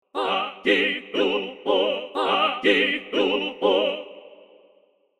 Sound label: Opera Duet Staccato; Voices Of Opera